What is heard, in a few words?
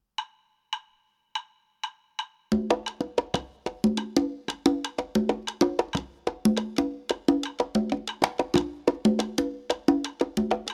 Guaguanco